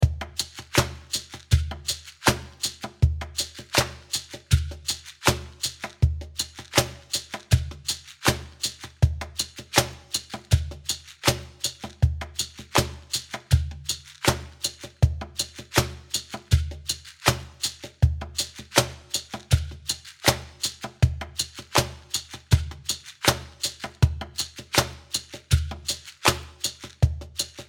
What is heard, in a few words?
level 4 drum groove